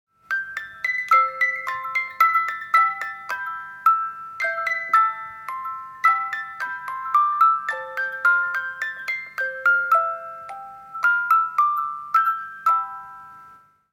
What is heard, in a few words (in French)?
boites musique